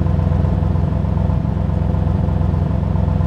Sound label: idle, engines; AUDI